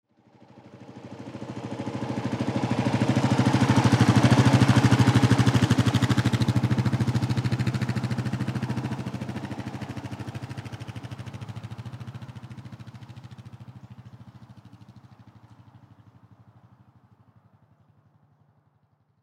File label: Motorcycle Kawasaki Pass By Slow; Transportation Automobile; Streetcar